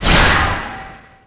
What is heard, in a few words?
skill bow range strike; FlyForInsanity